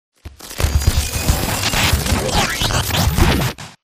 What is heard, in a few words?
Stalker Nuke Destruct Warmup; Titanfall 2